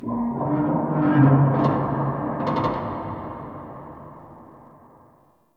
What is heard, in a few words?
Stadium Metal Creaks Far; Warframe; Ambience; Nineteen Ninety Nine